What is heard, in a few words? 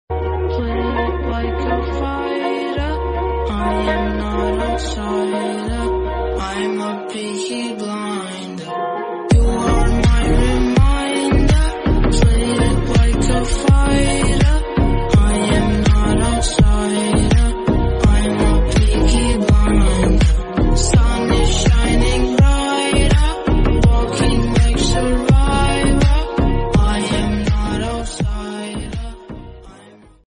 Instrumental Remix Ringtone; Peaky Blinders